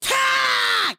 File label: Attack